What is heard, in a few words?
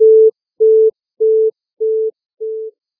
busy, calls